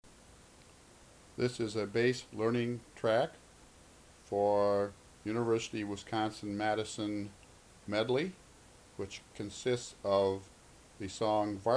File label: Varsity On Wisconsin Bass